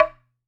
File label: Wooden Bar Strike